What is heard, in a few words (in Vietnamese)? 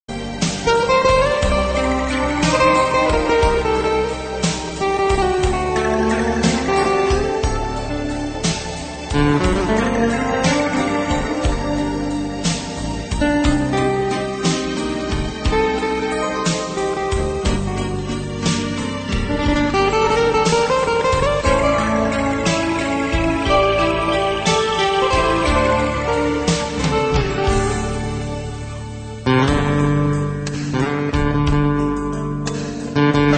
vo thung guitar